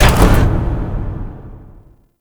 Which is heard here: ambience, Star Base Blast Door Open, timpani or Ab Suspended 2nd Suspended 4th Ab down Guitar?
Star Base Blast Door Open